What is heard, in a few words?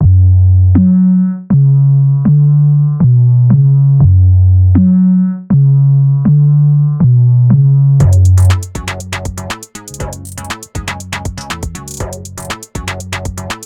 nightmare melody